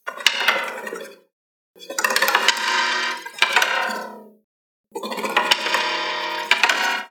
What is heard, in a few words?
Cartoon Broken Mechanism